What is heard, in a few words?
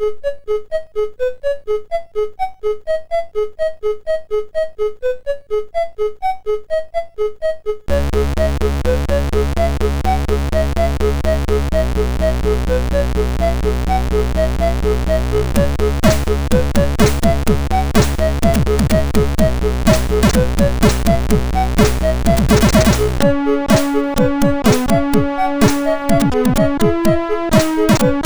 MOS6581R2; sid